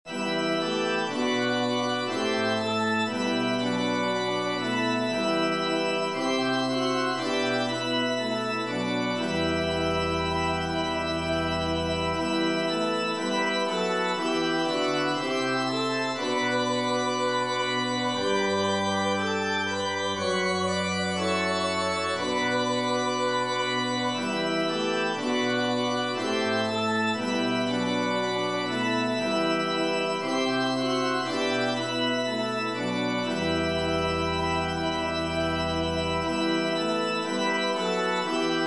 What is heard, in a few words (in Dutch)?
Jozef Lied Eenstemmig orgel